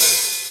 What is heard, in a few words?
Open Hihats, Cymbals